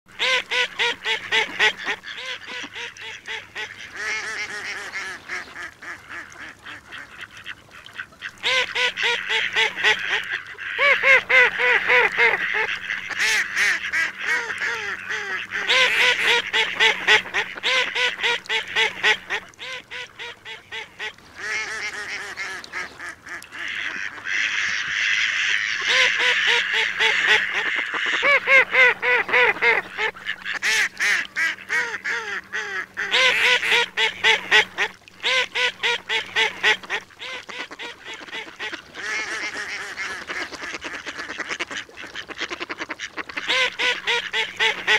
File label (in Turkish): ordek sesi vak vak